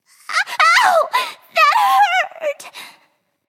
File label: death; smite; voicepack; scylla